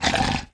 zombie damage, monster

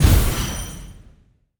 Trap Missile Turret explo; monster

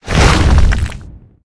skill explo

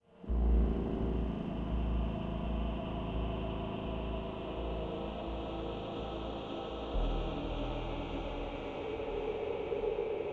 ruin, lake

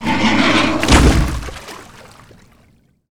leviathan, monster

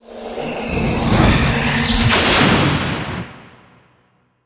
spector attack

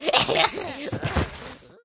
poison plant dead, monster

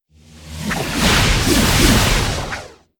ice lord, monster

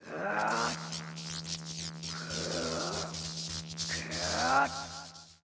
socerer idle

